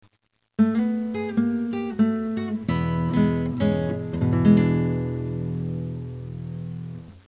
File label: nylon GUITARS